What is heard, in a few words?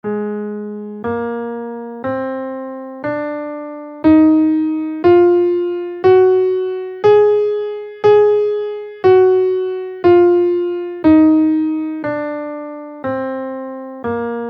lydian dominant scale in Ab